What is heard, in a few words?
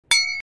"Ding"; Gintama; chinko sound